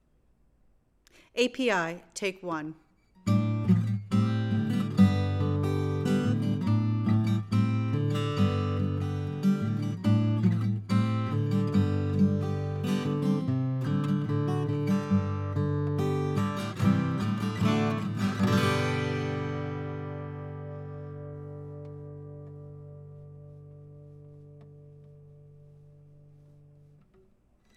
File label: Aco Gtr; mic pre shootout